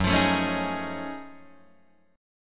F sharp Minor Major 13th, Guitar DADGAD

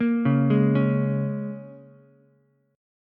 Bb Minor 7th, down, Ukulele; Bbm7 arpeggiated

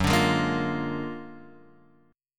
Gb Augmented Major 9th Guitar Open F; Gb+M9 strummed; JGuitar

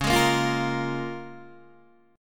Db 6th, Guitar, DADGAD

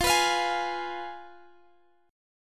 F Diminished, Guitar, Standard; strummed; JGuitar

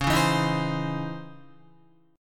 C sharp Minor Major 9th, Guitar; strummed; chordshape; 8,4,4,8,4,8; JGuitar

